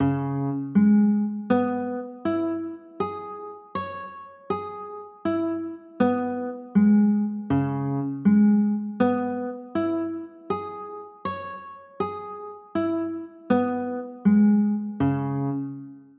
c sharp m7

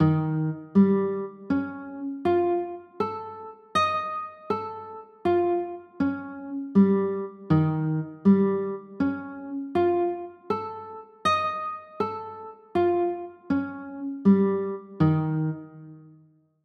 e flat 9b5, chords

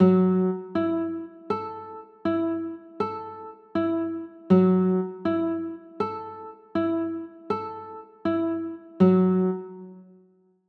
a 6, chords

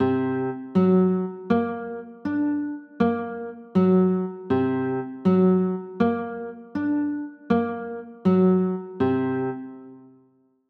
d 7 sharp 9, chords

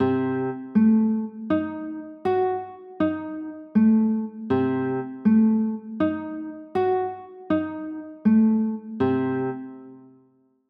c dim7, chords

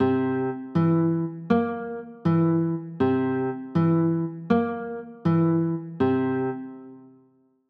c 6, chords

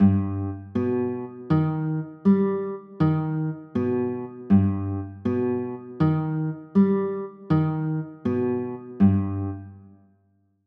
e flat aug, chords

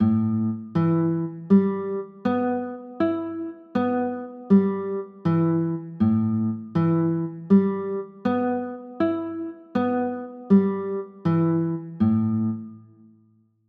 a m9, chords

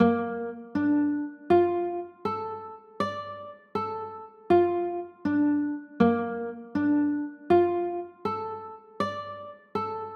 b flat maj7

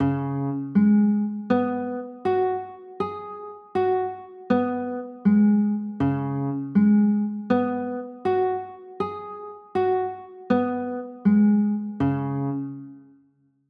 c sharp sus4, chords; c sharp 7sus4

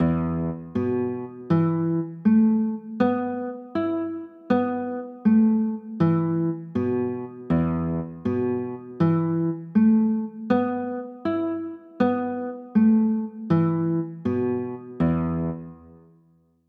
e sus4, chords

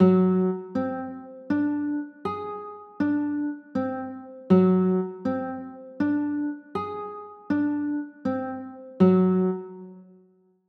a flat 7b5

